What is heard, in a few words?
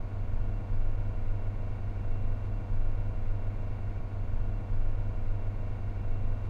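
Volkswagen Caravelle T5 2.0 TDI Engine - Interior - 3k rpm loop stereo; Soundholder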